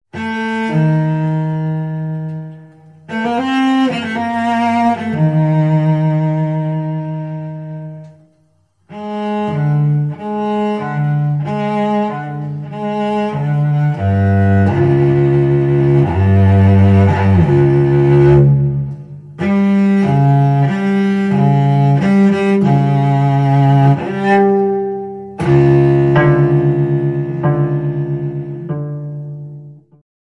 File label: Cello Danger Eerie